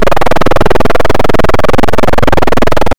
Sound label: a running mamooth, prehistoric